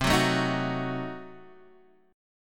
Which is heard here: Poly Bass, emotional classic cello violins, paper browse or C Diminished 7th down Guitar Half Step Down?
C Diminished 7th down Guitar Half Step Down